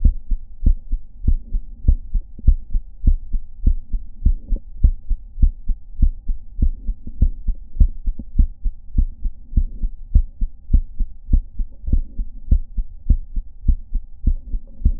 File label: s1 loud